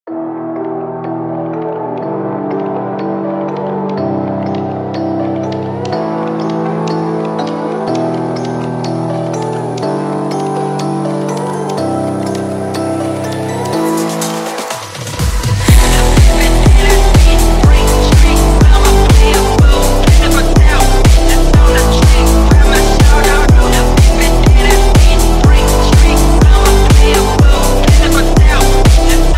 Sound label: CHILL PHONK; Tik Tok Remix Viral 2023